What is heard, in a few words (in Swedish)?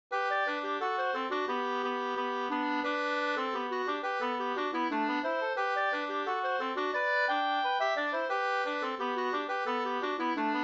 engelska; e Josef Pettersson e Jacob Abrahamsson; Svalte